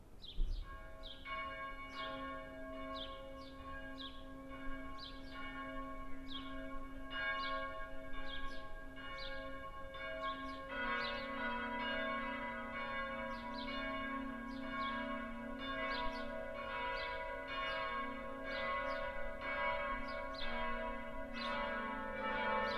Dom Plenum, Glocke

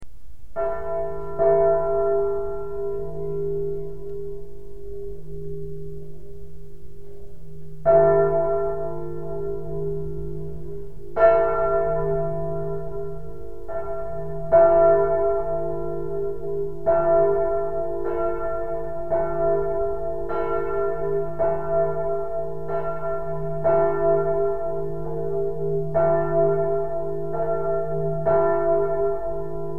Herford Münster Marienglocke

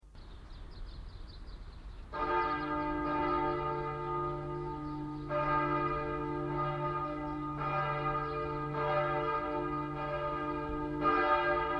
Plenum, Kreuzkirche, Glocken; Dresden

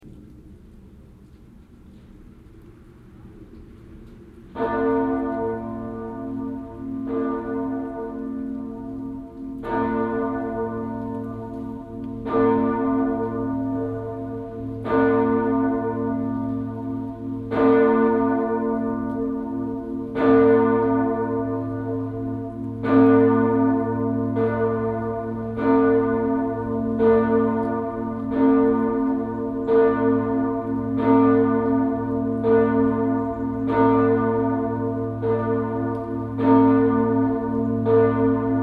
Dreifaltigkeit, Glocke; Frechen, St. Audomar

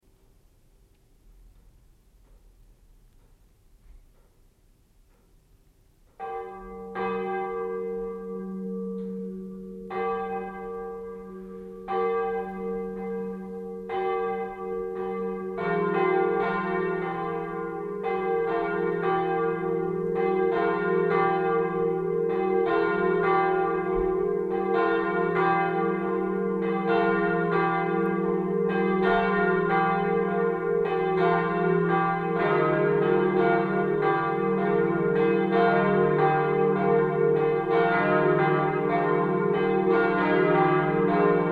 Vollgelaeut, Glocke; Frechen, St. Audomar

